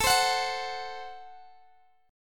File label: A Minor 6th A, Guitar, Open G